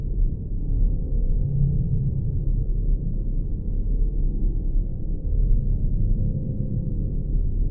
amb; alphamoon

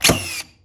Hitachi Framing Nailer (Full Power)

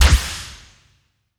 plasma weap

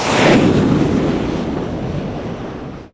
goal se; Nintendo

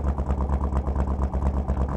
warthog hotrod idle; vehicles; loop

